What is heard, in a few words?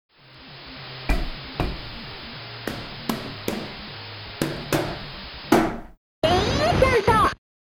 Snow Crash VRC Chip; Gotta Protectors